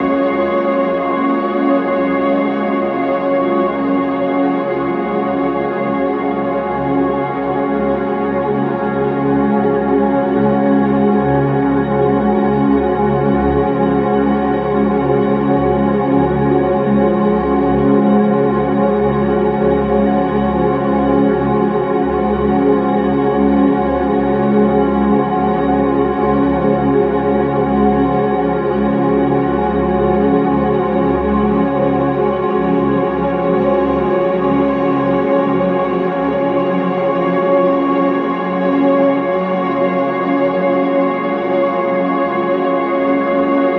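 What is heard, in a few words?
pad loop alive inside 150 C# major; Virtual Riot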